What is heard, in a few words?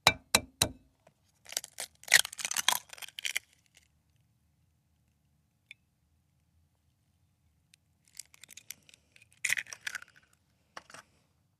Cracking Egg A Bowl, kitchens